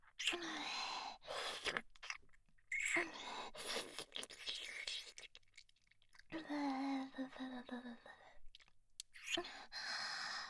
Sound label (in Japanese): フェラ音